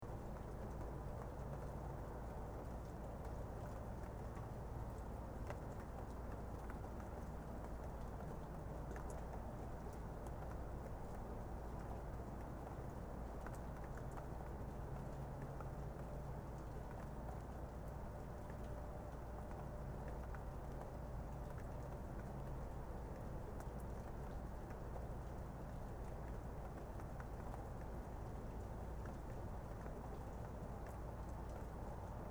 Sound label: rain